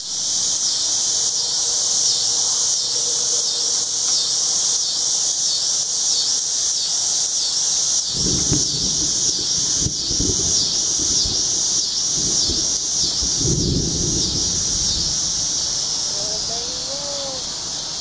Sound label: cicada buzz